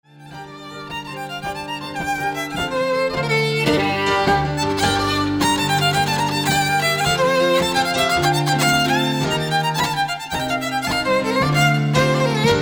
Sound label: G Minor Medley; Lakefield